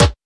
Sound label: round snare drum sound E key; snare drum samples